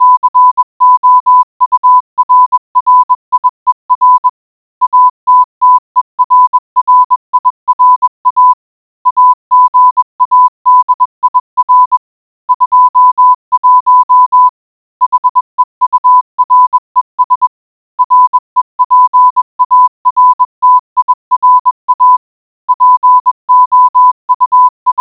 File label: morse code